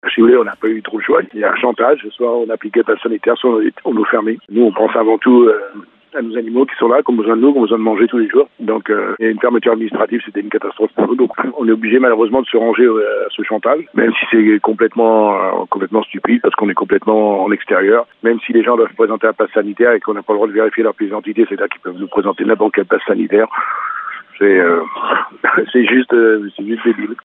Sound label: violas